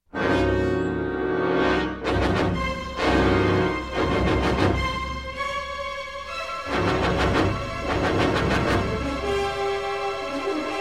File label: Fugue; star trek